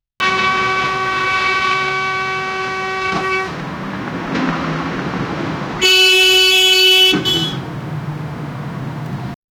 collision car with wall a; collisions

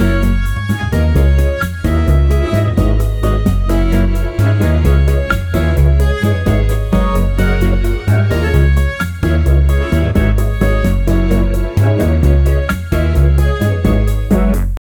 ambitious music uplifting

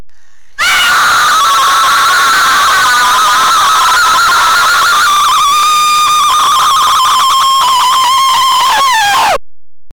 very loud female scream; distortion

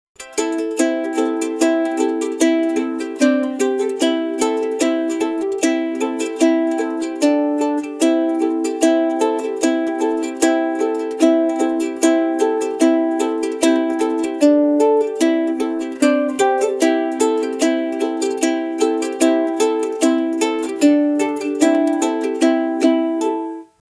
catchy ukulele melody wit

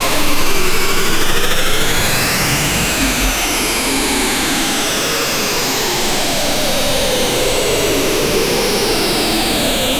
the wheel of time has, time

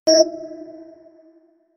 iconic sound ios style, beep

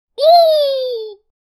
joyful non verbal exclama; human emotions

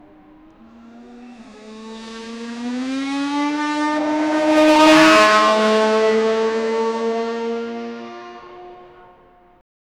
race cars sound

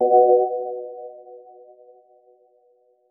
an startup sound, interfaces; an startup sound for an

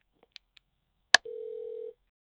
answering phone click sou

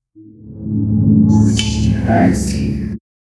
a futuristic sci-fi

